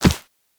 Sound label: Run Dirt, Footsteps; Warframe; Characters; Operator